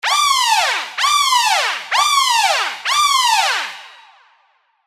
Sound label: Police; SERUM FX